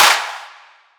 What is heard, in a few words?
drum clap bigger crowd; Virtual Riot; Drum Hits